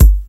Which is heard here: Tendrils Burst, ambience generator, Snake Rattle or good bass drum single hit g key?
good bass drum single hit g key